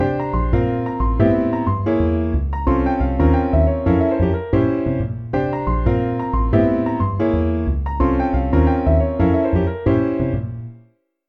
harmonizations

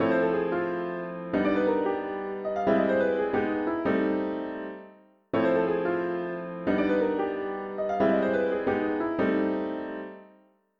aug. scale ii-v7 ii-v7

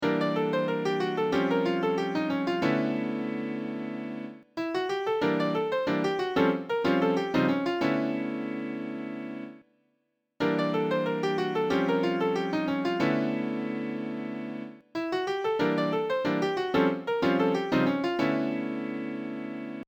w pno

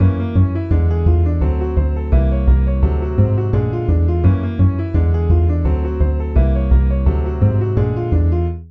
triadicisms dim

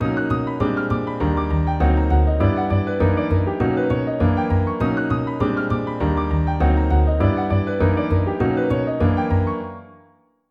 retro steps piano bass

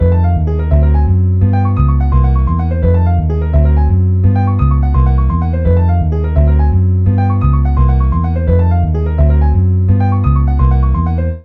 ii harms for sound maj

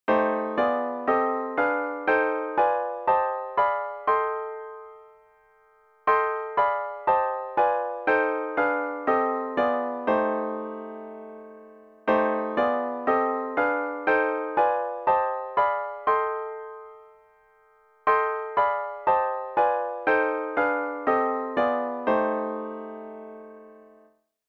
minor b6 scale as chords

